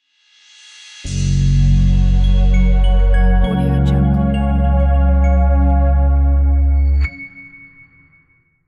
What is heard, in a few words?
airy intro logo; red-dog-studio